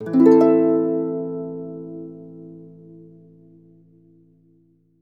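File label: harp; Best Service; Peter Siedlaczek's Advanced Orchestra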